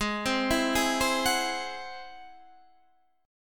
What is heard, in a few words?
G sharp Augmented 7th G sharp Guitar Standard; G#+7 arpeggiated; 16,15,14,13,13,14; JGuitar